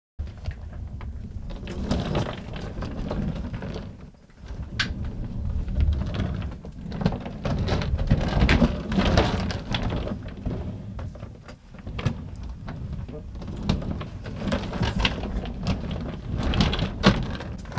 Wheeled Suitcase Rolling On Tiles

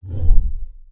Whoosh Woow

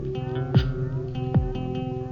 String Sample

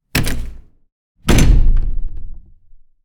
Angry Door Wooden Entrance Open Close Slam Hard

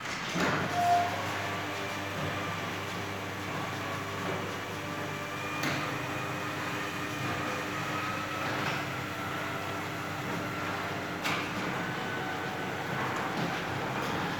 Garage Door Opening

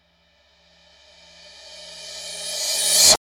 Rev Cymb Reverb

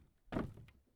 Jump Landing Wood

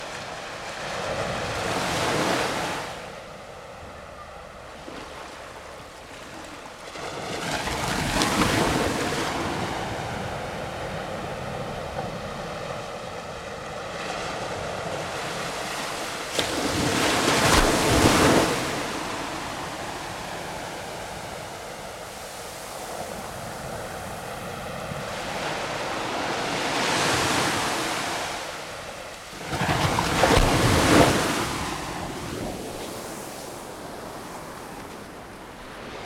Waves

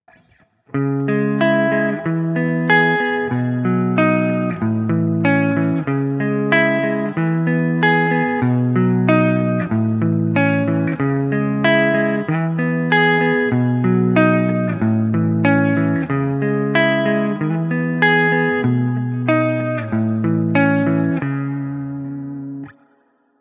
Electric Clean Guitar

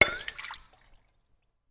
Knock Over Tip Over A Glass Of Water

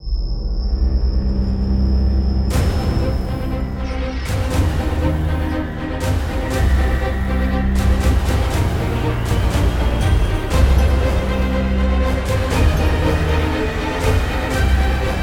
Horror Trailer

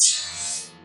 Short Metallic Hit

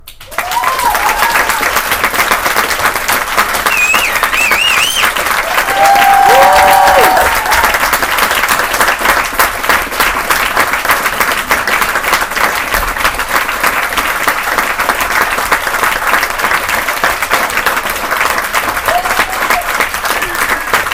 Applause

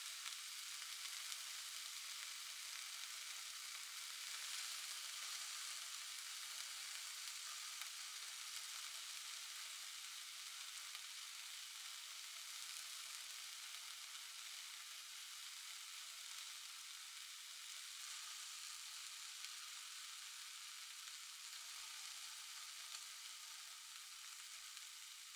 Bacon Frying Bacon In Frying Pan Sizzling

